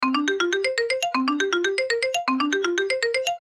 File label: alarm; PomoHappy